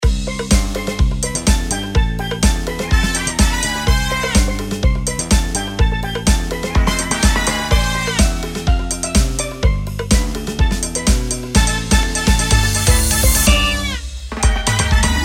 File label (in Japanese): 大食い大将 インスト